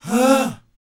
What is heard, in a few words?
BOYZ A#, Vocal Planet R&B & Dance; ILIO